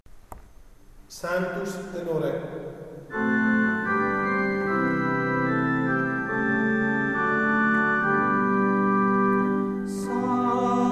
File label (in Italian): Sanctus tenori; Sanctus Lourdes